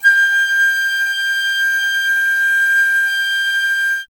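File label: flute piccolo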